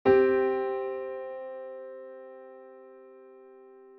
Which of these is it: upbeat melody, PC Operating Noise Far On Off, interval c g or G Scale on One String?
interval c g